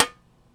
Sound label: Foley